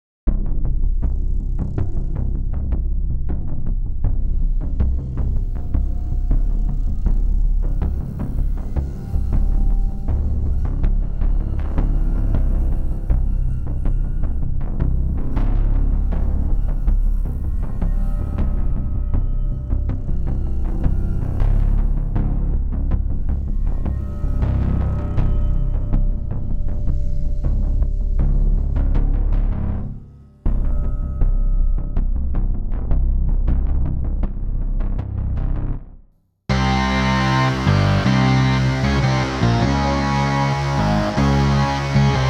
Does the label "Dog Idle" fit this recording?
No